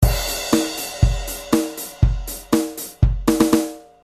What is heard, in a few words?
Drums